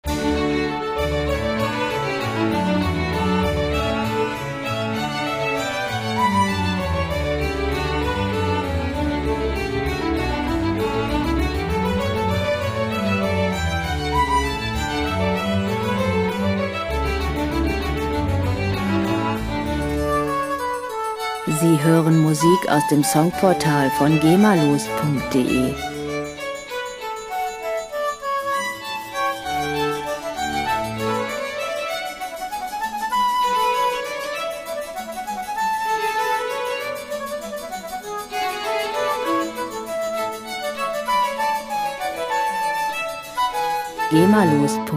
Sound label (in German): brandenburgisches Konzert